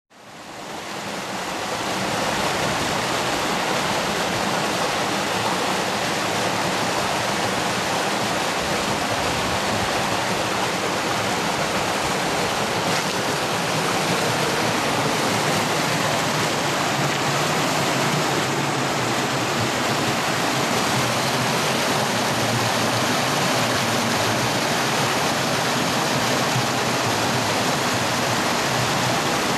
torrent

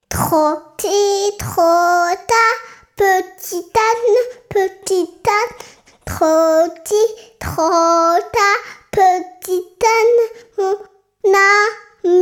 Trotti Trotta chant, Version chantée

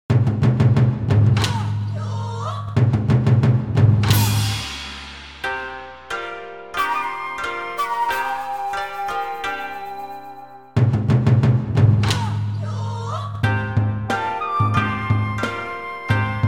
Sieste ou combat chant, Version chantée

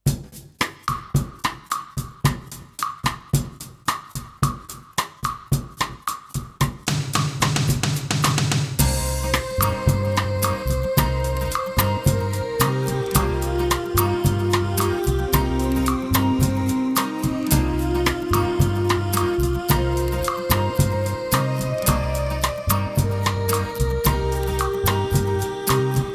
Vive le vent PB sans claps

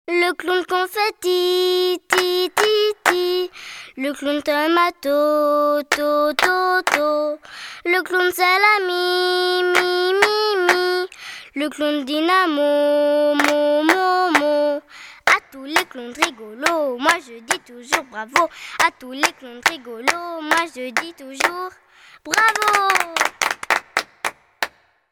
Bravo les clowns chant; chanson

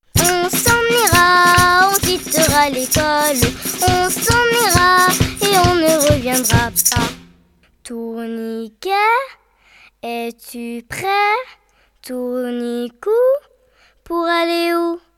Si on quittait l'ecole chant

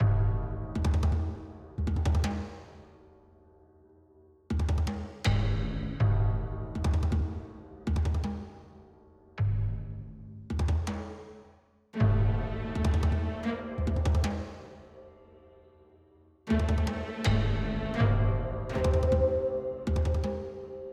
Peur; Peur 1

